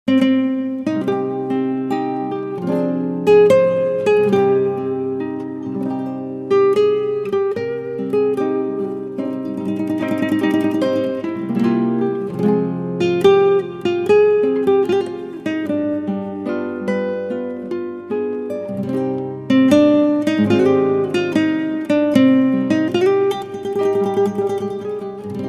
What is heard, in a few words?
Guitar